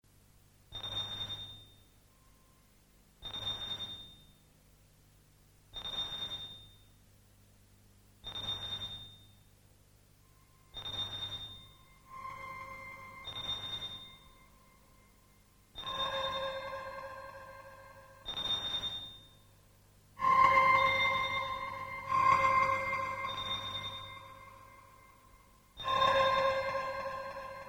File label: fuga